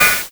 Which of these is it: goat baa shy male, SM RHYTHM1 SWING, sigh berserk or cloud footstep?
cloud footstep